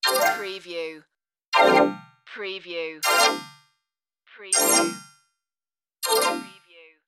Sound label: system message notifications